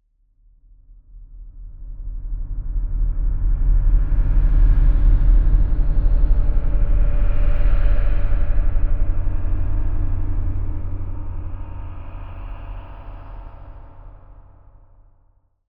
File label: Rumble Scary Voices Short Pad; Hollywood Edge; sound designer's toolkit v2